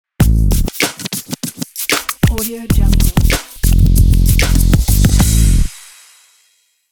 scratch urban hip hop beat logo

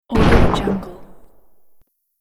world builder stone hit

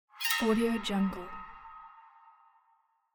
suspense hit

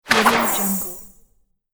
archery magic shot; sonic-boom

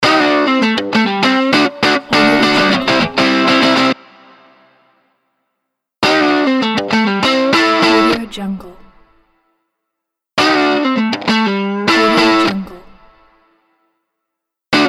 rock guitar logo pack; chasecreatness

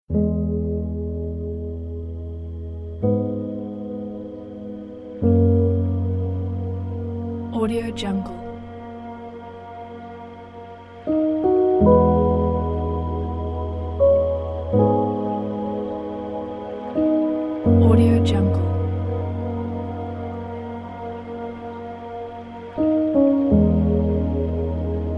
emotional piano ambient pack; mintwhalecap

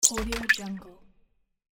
futuristic one up; monochromeeffect

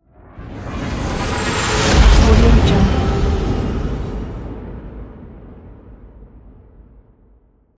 whoosh hit; archimusic

